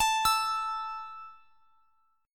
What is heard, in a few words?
A 5th A down, chord; A5 arpeggiated